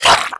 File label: grunt, headcrab